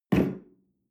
espresso place tablecloth slide short, restaurant cafe